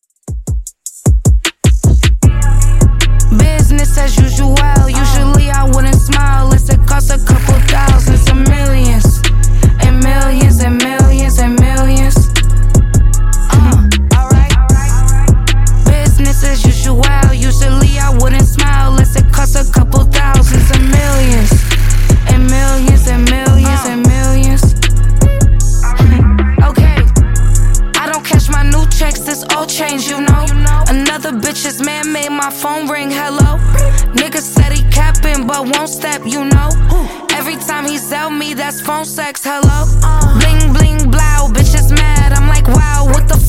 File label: Dj Intro Outro 77Bpm